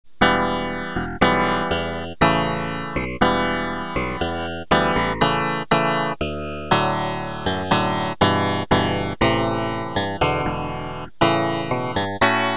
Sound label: comp gtr